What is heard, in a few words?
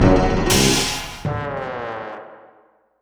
Lose; The Act; Arcade, iOS, MacOS, Mobile